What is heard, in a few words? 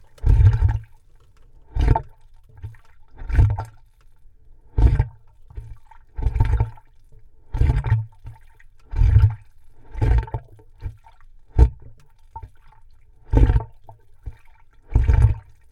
sump